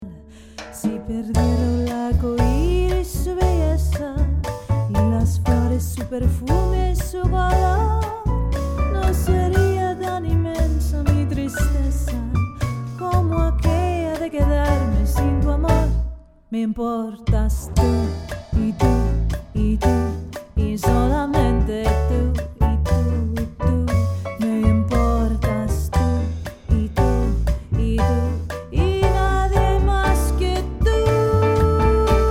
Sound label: Latin Singer; Los Angeles Latin Singer